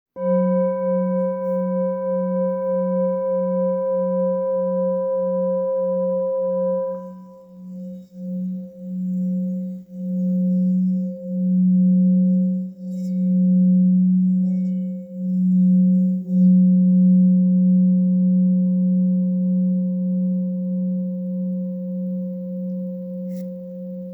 Fa 183 sin pulir, cuencos tibetanos

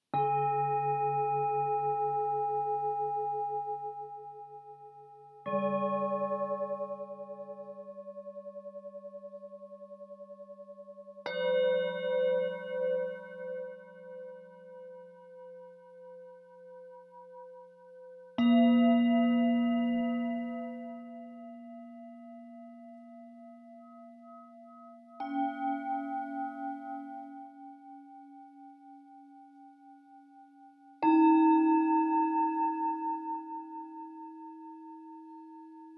set indio, cuencos tibetanos

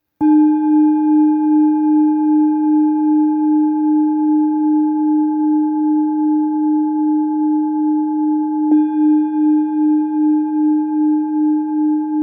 Re 303 bengali, cuencos tibetanos

